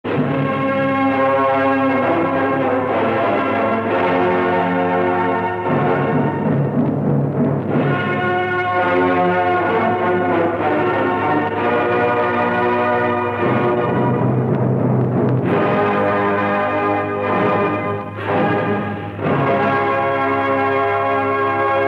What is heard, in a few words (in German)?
russland fanfare